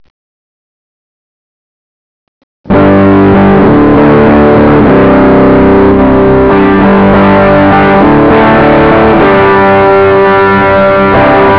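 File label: tube overdrive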